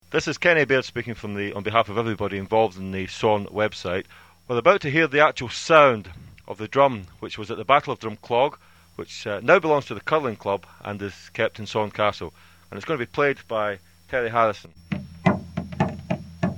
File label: full drum